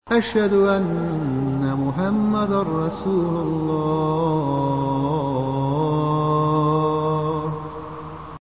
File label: the azan